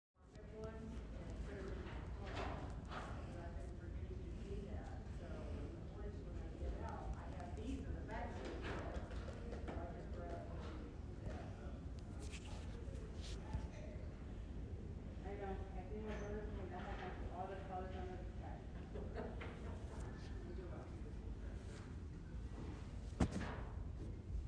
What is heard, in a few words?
Science Center First Floor Public Area; Keck